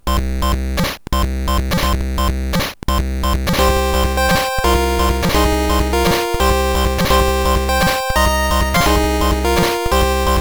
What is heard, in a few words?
extra beat